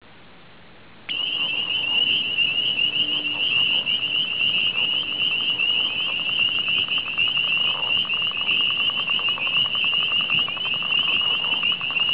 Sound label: leopard, frog